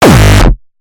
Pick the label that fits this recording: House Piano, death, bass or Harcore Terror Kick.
Harcore Terror Kick